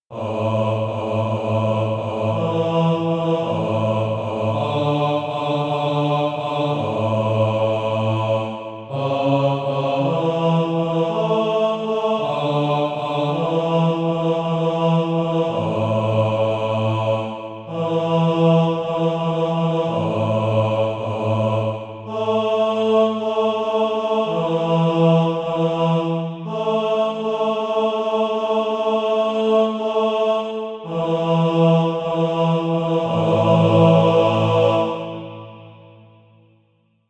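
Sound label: bass, Practise Music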